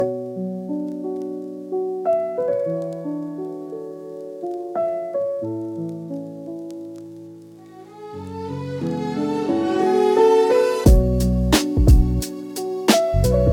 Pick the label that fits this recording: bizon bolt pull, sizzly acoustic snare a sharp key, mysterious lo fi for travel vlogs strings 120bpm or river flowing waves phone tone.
mysterious lo fi for travel vlogs strings 120bpm